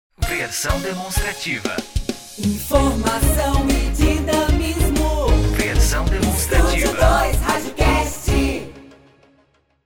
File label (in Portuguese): Passagem, jingles vinhetas cantadas